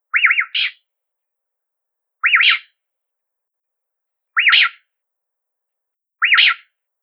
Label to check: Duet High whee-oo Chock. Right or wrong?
Right